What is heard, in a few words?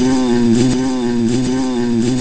buzz buzz